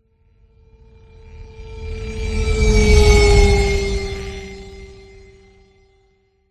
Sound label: Passby Designed Whoosh Futuristic Scifi Airy Synth Spaceship Medium; PMSFX